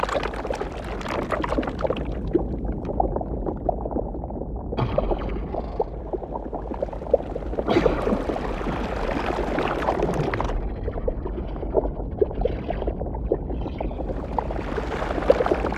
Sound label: Frenzied Chittering Loop